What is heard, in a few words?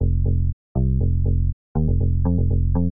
bass line, 120 bpm; baseline junkyard